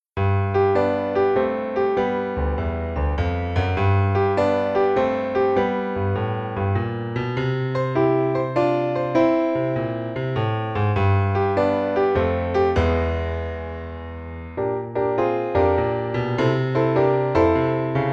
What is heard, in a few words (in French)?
Piano seul